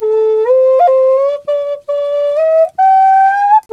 didgeridoo riffs

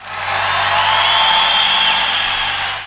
APPLAUSE; Best Service - XXL Production Effects

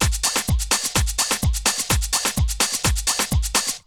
Essential beats

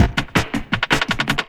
LOOP, 160 BPM, Drum & Bass; Ueberschall; Drum & Bass Resonance

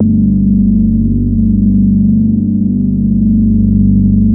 G2, BASS; Q Up Arts; Dream Experience

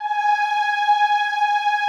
OOH G4 L, Extended Classical Choir; Best Service; OOH MIX

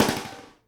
snare GLASS, Live Snares; E-MU Producer Series Vol. 8 – Platinum Phatt (CD 1)

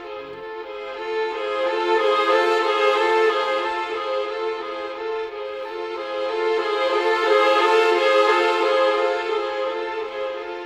Cinematic Strings, Orchestral; Sonic Foundry (Sony Creative Software); Orchestral Series VOL-3 - Cinematic

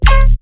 BASS ONE SHOT

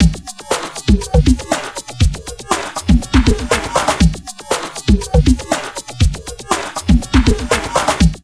Drum Loops Crazy Processed, 120

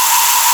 A#4 ANTIGRAVITY; Northstar; The Wizard VOL-1

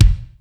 BD, Casio CTK-5000

